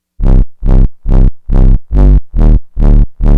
Trance Bassloop 140bpm, Bass Arps

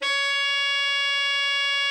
tenor, tenor sax

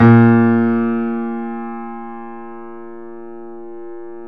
PIA GRND, Roland

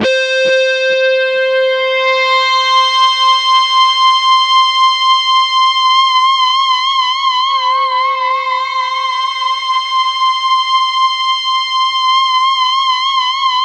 C4, Roland JV 2080 TECHNO PAD

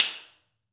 SLAP STICK

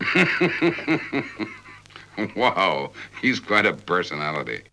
VOX; Zero G; Man Machine

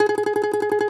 DOMRA, Segundo Domra, Plucked Strings

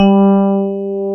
TX 802

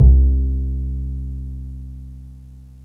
STRING BASS; Greytsounds; F3 STRINGS

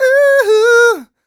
128 uh e-flat, mal, Vocals

